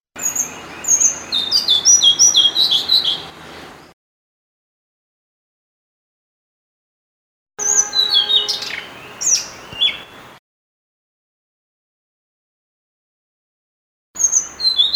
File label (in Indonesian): kicau sikatan blue throated